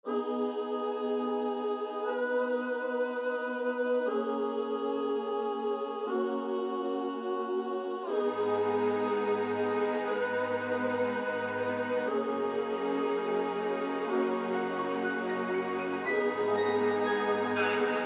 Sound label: Classic N HipHop with Rap